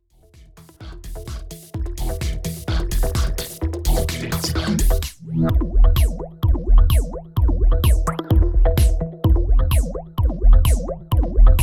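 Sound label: Denon DJ X1850 Phaser Frequenz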